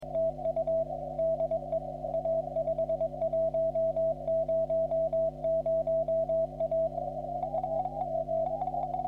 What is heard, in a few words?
40m cw